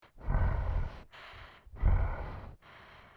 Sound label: Breathing; inner demons